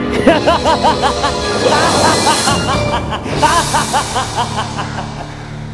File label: cacklel; Kaiba